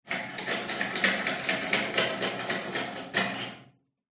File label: household soap dispenser lever button press multiple room reverb